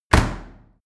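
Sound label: dry cleaner open hard, maschinen türen; tueren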